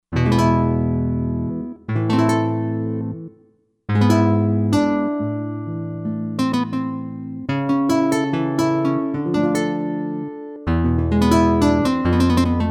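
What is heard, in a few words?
Guitar Clear Nylon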